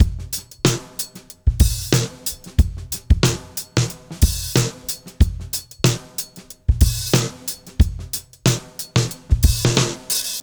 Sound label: Beat Loops; Zero-G; Monster Beats